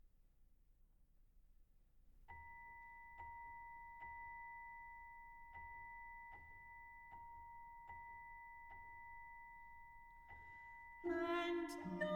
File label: songs for soprano and orchestra, prologue